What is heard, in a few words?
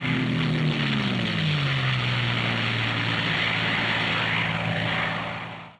engine